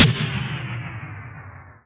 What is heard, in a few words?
Percussive Stab